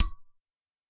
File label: Ching, Trombone Extended Technique